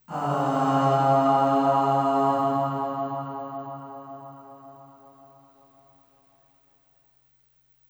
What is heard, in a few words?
Aah Short C#2 Long Reverb; Harmonic Building Blocks